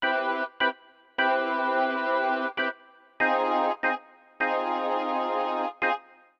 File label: c major 7 drawbar organ rb 75 bpm